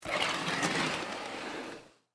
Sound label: Shade Open Door, Household, Wall; Windows (Sound Effects:Household); BlastWave